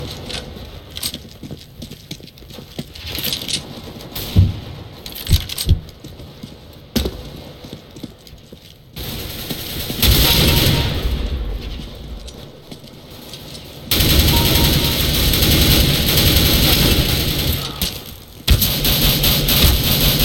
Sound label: Acoustic Engine